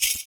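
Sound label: PERC, percussions; Univers Sons - Raricussions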